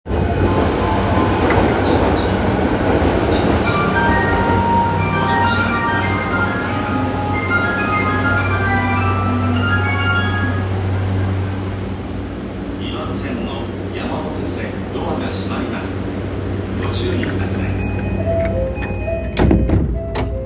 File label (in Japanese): JR 山手線 御徒町 CW, train sounds